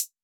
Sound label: Analog Hi-Hat, Electronic Drum Samples; Hi-Hats; E-Punk Project Drum Samples